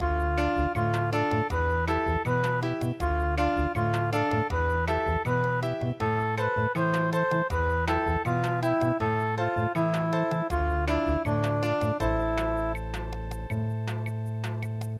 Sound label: bossa, midi; usagi